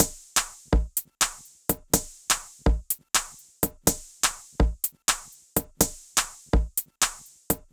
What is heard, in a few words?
Percussion Loop - 124 BPM; Cymatics; ANALOG EVOLUTION Beta